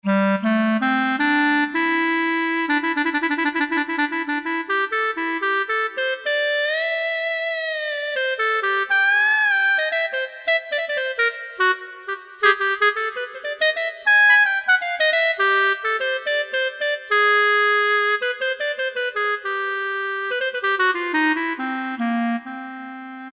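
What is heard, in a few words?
Solo Clarinet; Generalmusic S2R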